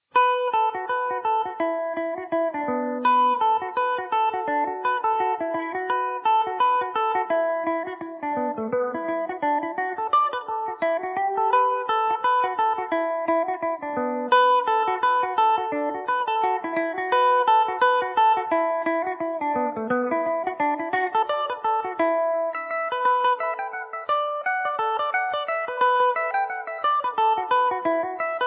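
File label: E dorian, Irish mandolin; laffertys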